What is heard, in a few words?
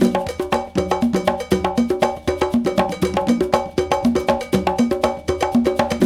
Bembe 6 8, World Dance; Spectrasonics; Supreme Beats